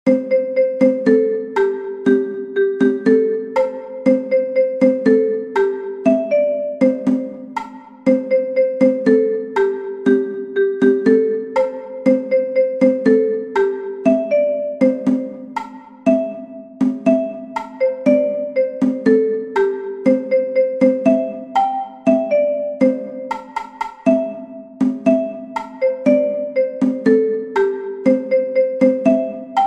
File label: Cajon; Chatter with the angels